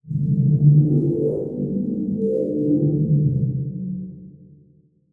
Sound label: Transition Stab Dark Musical Tonal; Production Element